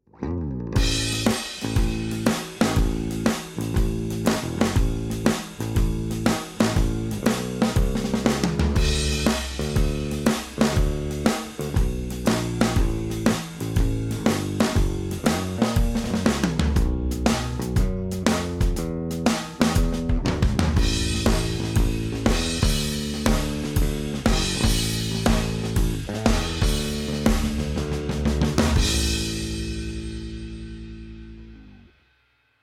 drum bass

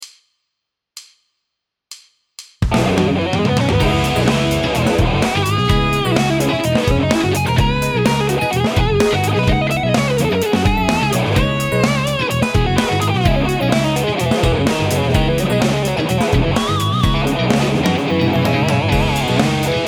solo

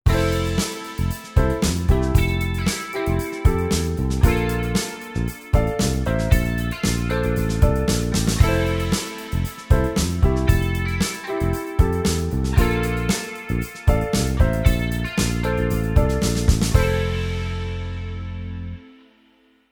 church mode; ex church mode

